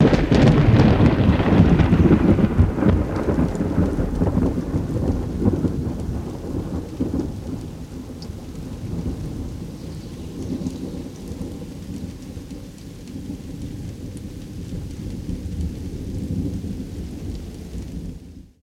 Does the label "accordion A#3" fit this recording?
No